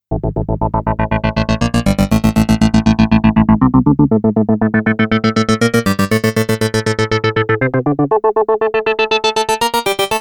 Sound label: synth